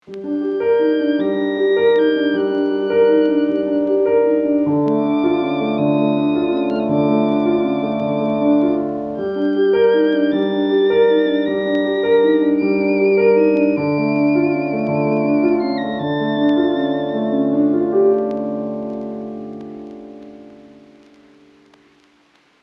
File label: Old haunting piano and violin recording on vinyl